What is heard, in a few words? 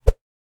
woosh; spaghetti